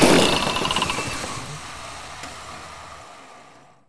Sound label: over Heat; BaboViolent 2